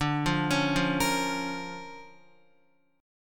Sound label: D Minor Major 7th Sharp 5th, D, Guitar; Listen to DmM7#5 arpeggiated; JGuitar